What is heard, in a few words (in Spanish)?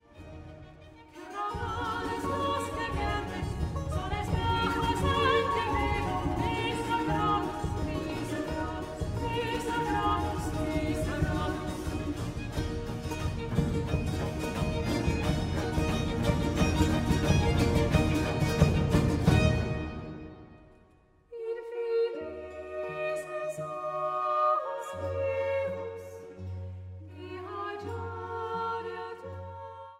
Adagio Tonada, Andantino Tonada para baylar; el Tuppamaro de Caxamarca; el Diamante